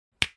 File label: Snap; China Telecom